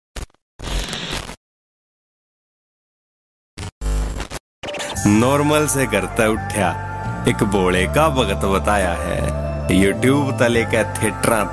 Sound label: New Haryanvi Dj Song